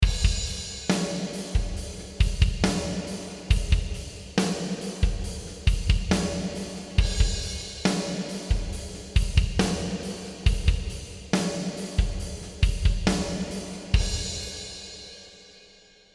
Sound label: drums racquet 57s, snare